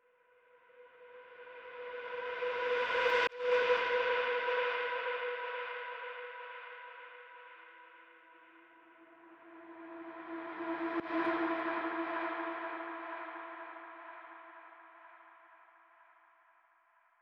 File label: 125 BPM B Min Accent; Cymatics; Hide N Seek; 2022 Melody Collection Platinum Expansion